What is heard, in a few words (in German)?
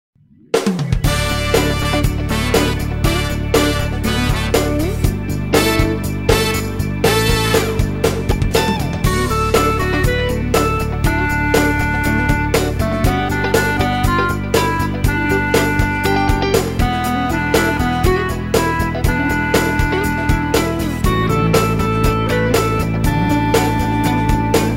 klarinettentaxi